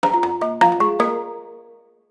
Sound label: Safari alarm; Nokia; Audio themes